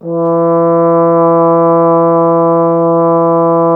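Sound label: brass bass trombone E; Miroslav Vitous; Solo Instruments VOL-1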